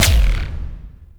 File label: Pod Shotgun Proj Die